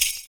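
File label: PERC, percussions; Univers Sons - Raricussions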